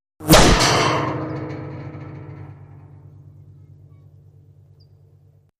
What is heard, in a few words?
Bullet with Whir Impa, metal